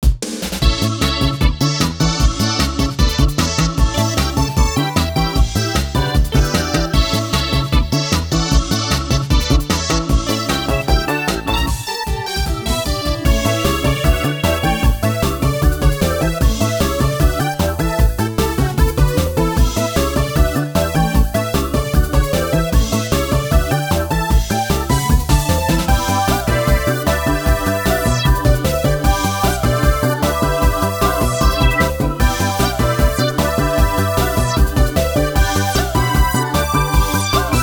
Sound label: High Tension; Memories Off Sorekara